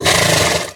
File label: snort